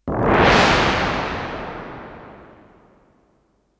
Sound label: Single, Single Crash; Objects; BBC